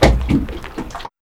WATER; E-Lab; X Static Goldmine 1

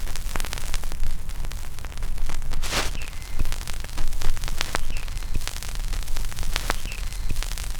CRACK; E-Lab; X Static Goldmine 2; akai

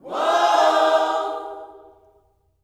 WHOA OHS, Gospel, Vocal

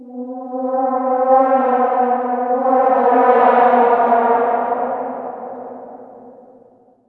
ATMOS

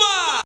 VOC

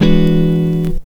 GTR CHD

